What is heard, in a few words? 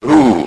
"ooh" male